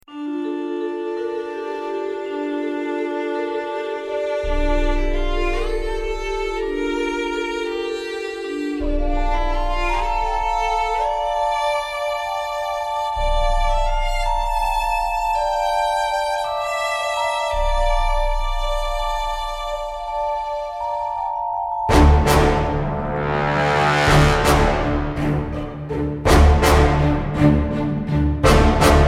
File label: 100bpm, Episch; hartwigmedia